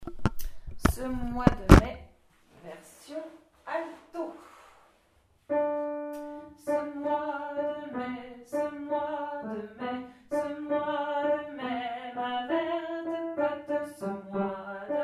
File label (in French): alto; Ce mois de mai